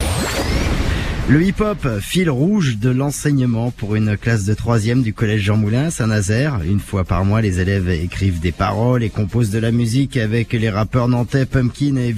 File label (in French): atelier hiphop; Jean Moulin